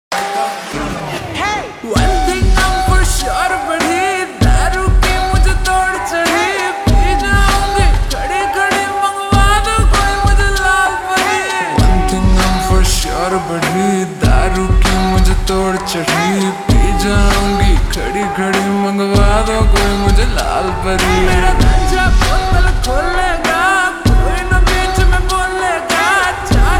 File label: Slowed Reverb Ringtone